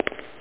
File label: foot clop echo; alienbreed3d2